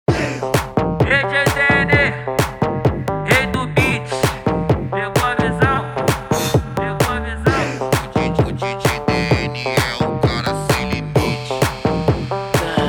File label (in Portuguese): eletrofunk; cd equipe revoada especial fim de ano